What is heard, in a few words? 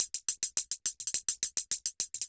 808 Loop Hats 105, Roland 808 Drum Loops, 105bpm